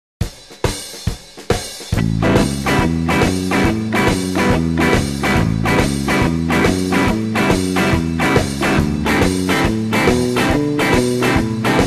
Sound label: Rhythm Guitar